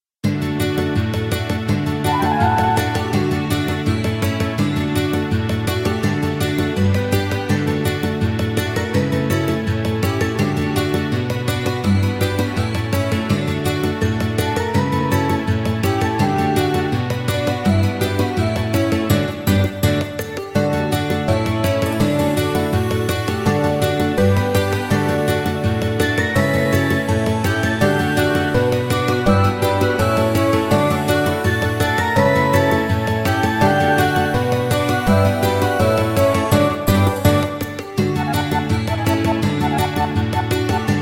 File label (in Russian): Мелодия; MUZYKALNYEIGRY